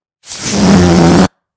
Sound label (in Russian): zvuk sduvajushhegosja sharika